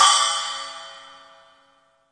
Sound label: real; REAL LIVE KITS; A Real Freakin Drum Kit